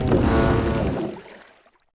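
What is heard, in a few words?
Work Boat Ancient Fort; Civ4 warlords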